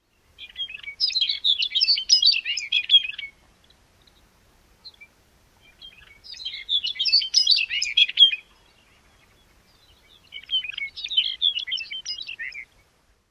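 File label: Lapland Longspur, songs number 2; AK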